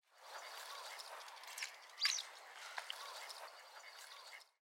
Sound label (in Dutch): Balkankwikstaart